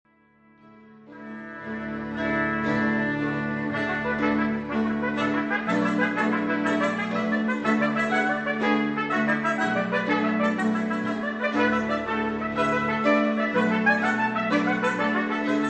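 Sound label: Music for trumpets and strings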